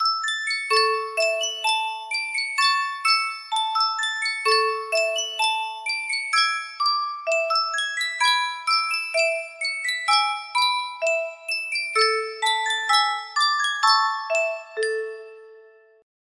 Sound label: superstar music box